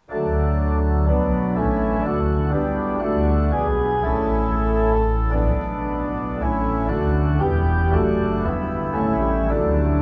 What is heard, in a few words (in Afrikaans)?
Gemeentesang